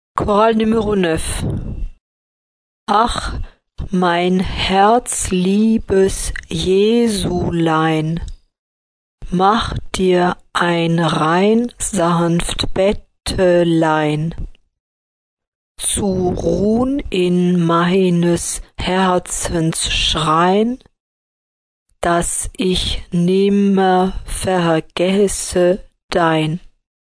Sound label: choral; partitions chorales